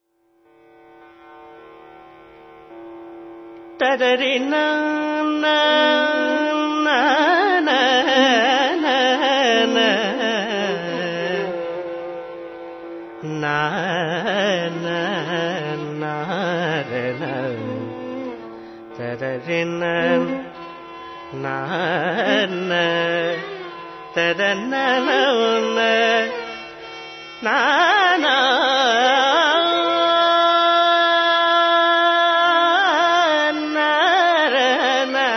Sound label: Tamil Classic; RKC Live Stream; Indian Artists